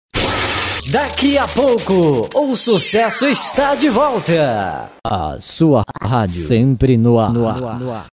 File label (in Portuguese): vinhetas passagem